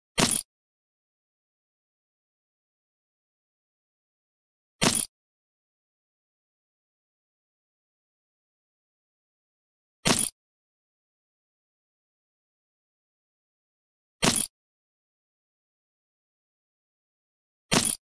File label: Cowboy boots; چکمه کابوی